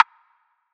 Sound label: Snare